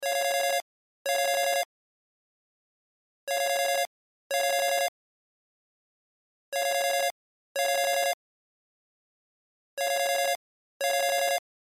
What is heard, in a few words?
Cell Ringtone; Loop; Cell Phones; Electronics